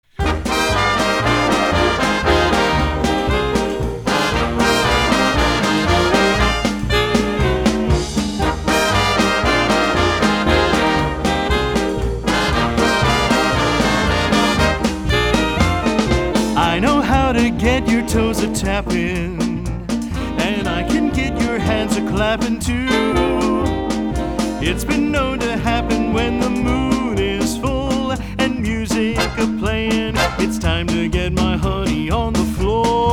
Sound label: Polka Good Time